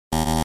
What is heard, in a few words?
fail